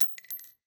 bullet shell drop

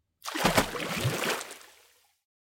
heavy splash; soundscrate

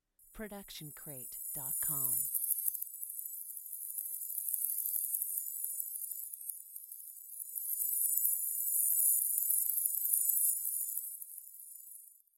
Magical Portal Shimmer; SoundsCrate

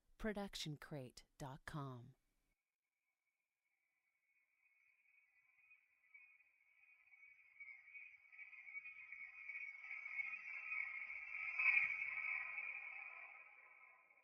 reverse shimmer; soundscrate

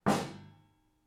Metal Footfall; soundscrate

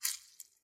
blood squish

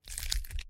Bone Crack; SoundsCrate

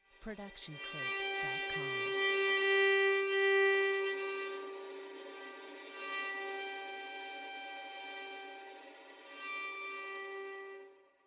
Violin Airy Scratch Harmonics

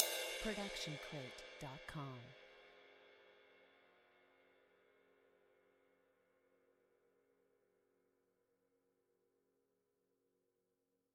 ride cymbal 20 inch; soundscrate

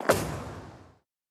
bullet impact woosh

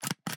Camera DSLR Shutter Slow

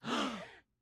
audience gasp

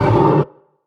monster roar short; soundscrate

